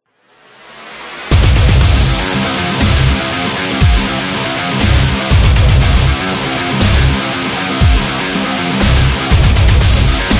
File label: Metal Rock